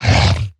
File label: zoglin attack; mob; Minecraft